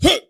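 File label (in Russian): странный мужской крик короткий активный резкий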